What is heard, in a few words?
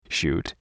Shoot, robot